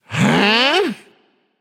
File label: confused; chronos